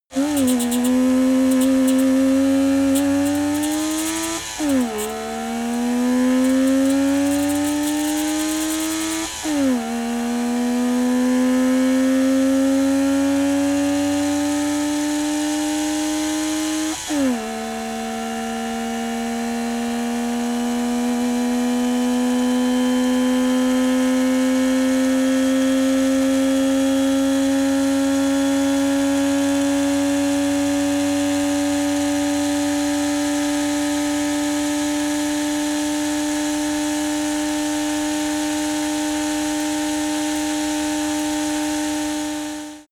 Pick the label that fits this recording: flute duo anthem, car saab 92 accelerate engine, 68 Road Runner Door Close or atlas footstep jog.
car saab 92 accelerate engine